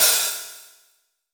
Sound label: Std Open HiHat alt; Roland BK-5; Hi Hats